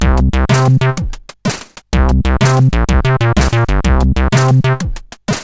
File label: intro sid CSG8580R5